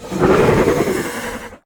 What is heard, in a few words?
Grounder Sniff, Kraken; Evolve